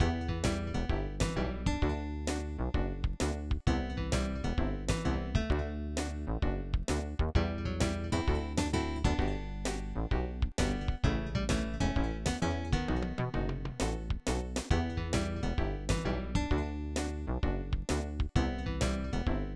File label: Battle Lose; SNES